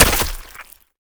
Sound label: Monster Death; Game rip; MiSide